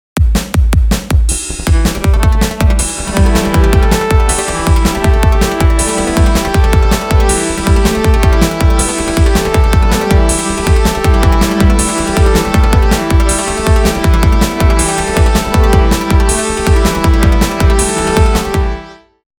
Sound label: rock